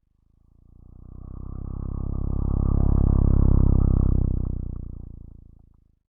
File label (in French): Manivelle; design sonore